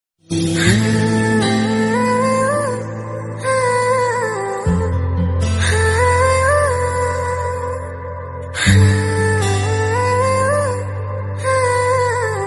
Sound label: Song Sad Ringtone